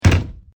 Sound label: Door Crack; Backrooms 2D